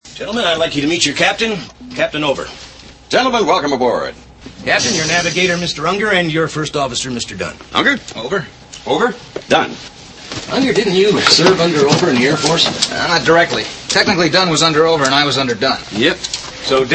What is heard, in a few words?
airplane